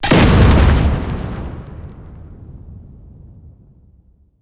Sfx Rubble Impact; Minecraft Story Mode; Colossus

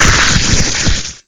Lightning Gun Start

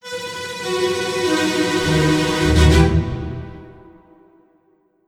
Dun Dun; Return Of The Obra Dinn

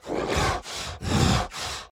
Enraged Breathing Loop; The Town; Burns

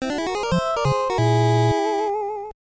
Unknown Jingle; Famicom Mukashi Banashi Yuuyuuki; FDS

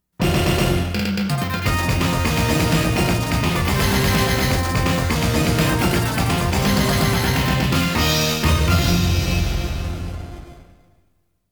Pattern Clear; Konami Game Music Collection Volume 1

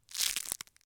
Tape; Cherricar

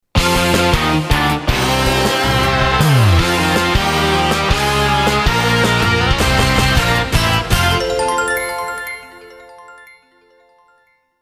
Stage Clear; Asagi Mode; Prinny Can I Really Be the Hero

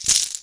Coins

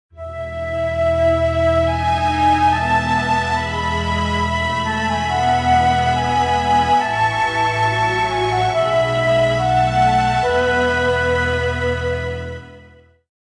Industrial Calm; Civilization Revolution

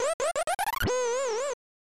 Tile Match Jingle; Shanghai 2 Dragon's Eye; snes

